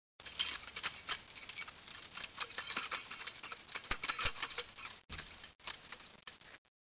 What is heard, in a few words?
keys